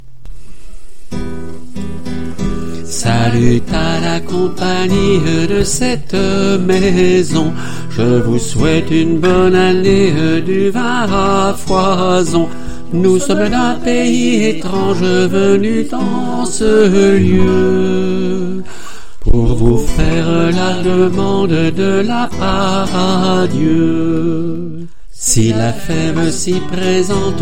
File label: ALTO, chorale